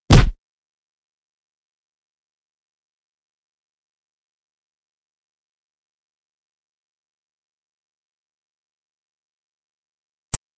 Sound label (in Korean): Fights, 싸움-전투; 헐리웃특수효과음